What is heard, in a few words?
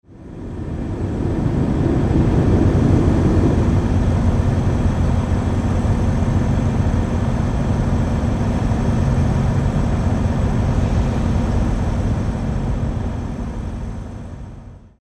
Truckstop Night Sounds; truckstop overnight sleep sound